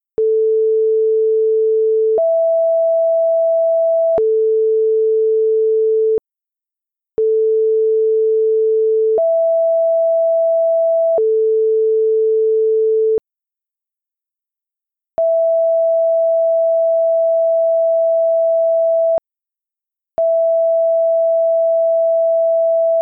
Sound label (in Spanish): quintas mel